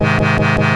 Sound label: organ; Goldwave